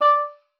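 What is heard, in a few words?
D5, oboe d'amore; Xsample VOL-7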